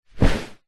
furniture sit down fabric sofa; household; zapsplat

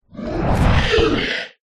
horror monster creature impact growl squeal

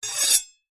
knifes medium scrape blades together

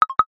ui notification tone x3 fast

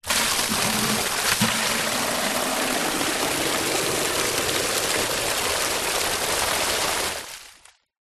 nature water pour plastic bucket heavy flow; zapsplat

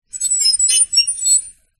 foley metal sharp scrape squeak shrill

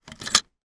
car seatbelt click into lock; transportation

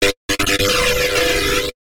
sound design glitch or short circuit

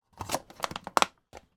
food drink plastic lid put on drink cup; macdonalds

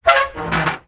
industrial metal ladder scrape single short; zapsplat

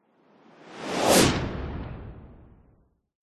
sound design whoosh fast rustling; zapsplat

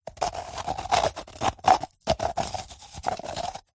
coconut shell 2x halfs empty scrape turn hollow; zapsplat

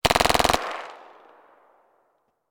gun ppsh; still north media

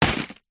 leisure lego box down on table

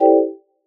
Selection Deny; sound ex machina

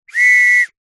foley whistle two tone single blow close up

